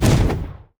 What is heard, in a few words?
deathwood ranged attack impact; sfx